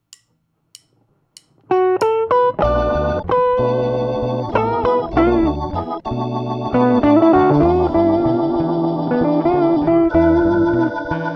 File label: Soul Blues Drumming